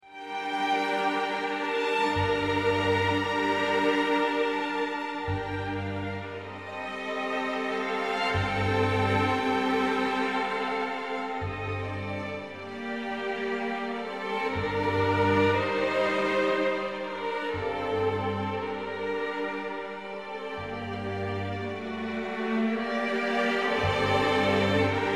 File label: Simple Symphony for string orchestra, Sentimental Saraband; Britten